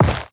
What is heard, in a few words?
leather drop; ui; inventory